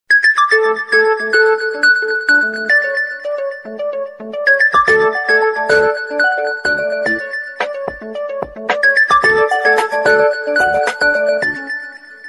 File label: forward tone